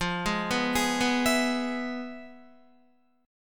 F Minor Major 7th Flat 5th Guitar; FmM7b5 arpeggiated; 12,11,11,13,11,12; 4F,4A,5C,5G,5C,6E

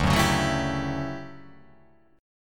D Major 11th D down

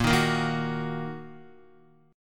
Bb Suspended 4th Sharp 5th, Bb, down, Guitar; Bbsus4#5 strummed

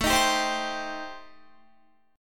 A-Minor 7th Flat 5th, Guitar, chord; Am7b5; strummed

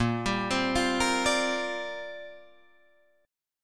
A sharp 6th Add 9th, Guitar Drop D

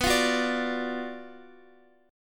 Gb Major 7th Suspended 4th Sharp 5th

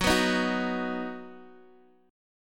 F sharp Suspended 6th; F#sus6 strummed; chord shape

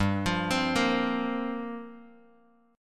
G Minor 11th, Bass Standard

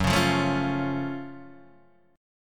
Gb Minor Major 7th Gb down Guitar Open D

